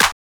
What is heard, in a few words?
Claps; Vengeance Samples